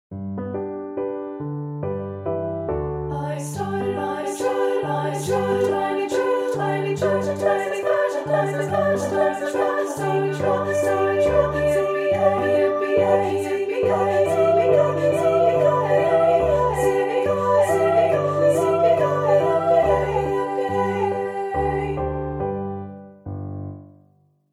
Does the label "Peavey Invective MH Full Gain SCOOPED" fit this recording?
No